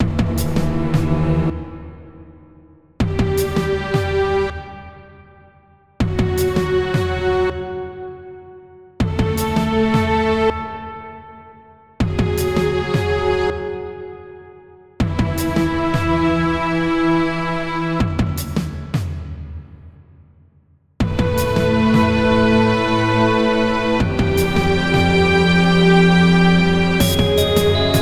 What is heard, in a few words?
remix